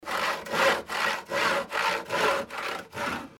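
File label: saw; scie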